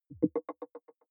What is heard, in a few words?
button echo marble bubble single; maschinen; signale; soundpool